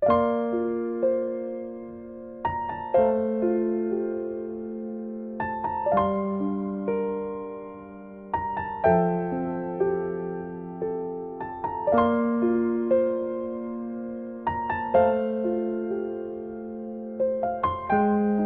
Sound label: Lullaby; Phoenotopia Awakening